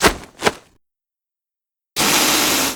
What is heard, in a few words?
whistle full; sasha buckler